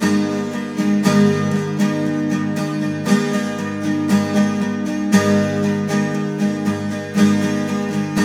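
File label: 12 string minor A, guitars; Spectrasonics; Hans Zimmer Guitars VOL-1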